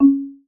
Marimba

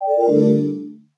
call end; Nokia; 770 Internet Tablet

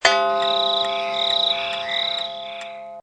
Hour Indication; GX500

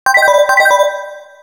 Serene tone

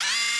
Zoom Out, phonetones Motorola Q11

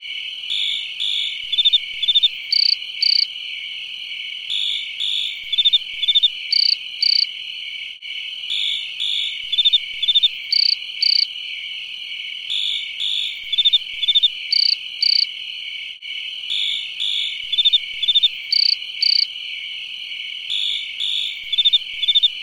call mail; HTC; Infobar-A02

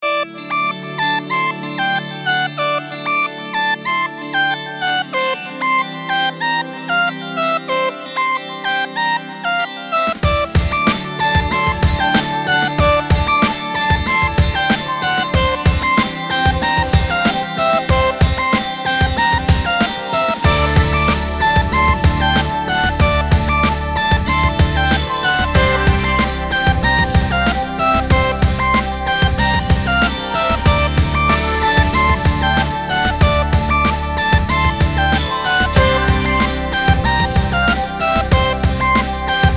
Bleeptones Alarm; Sony Ericsson; W707; PlusFourSix; Alicia; Lars Edvall

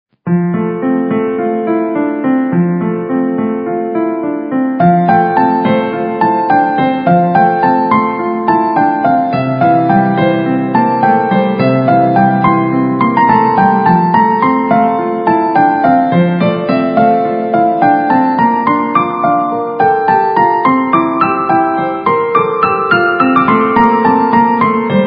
Piano Stunt; Sony Ericsson; M600

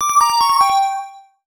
Urgent Reminder; Sony Ericsson; Xperia X1; PlusFourSix; Venus; Lars Edvall

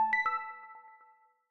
new particle touchdown; LG G Pad III 8.0 FHD

